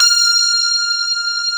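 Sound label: grand piano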